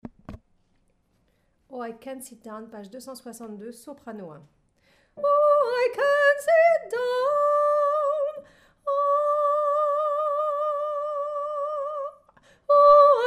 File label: Soprano